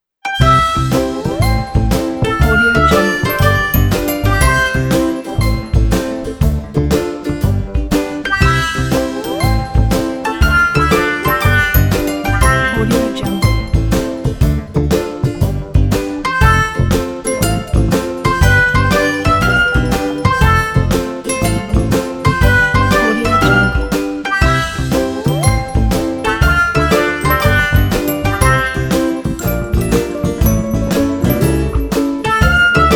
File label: funny march; mediastockmusic